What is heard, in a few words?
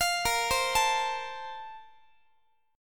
A sharp Major 7th Suspended 2nd, Guitar; arpeggiated